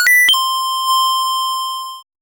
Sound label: coin sound for a, 8bit